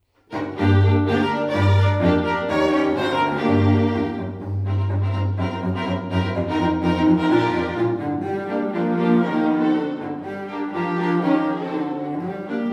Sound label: Strings, 4tet; Clementine Strings